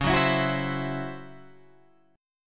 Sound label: C sharp Minor Major 7th Double Flat 5th Guitar Open G